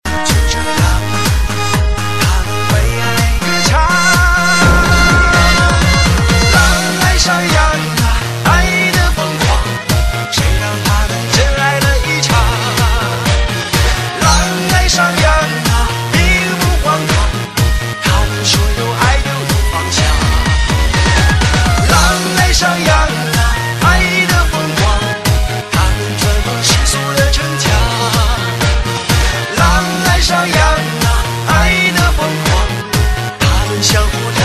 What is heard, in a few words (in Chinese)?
Dj 劲爆节奏